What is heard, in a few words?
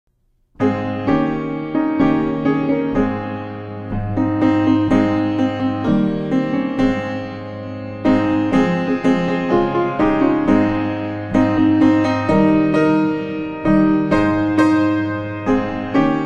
Piano